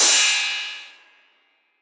crash single hit f key, Royality free drum crash sound tuned to the F note. Loudest frequency: 7061Hz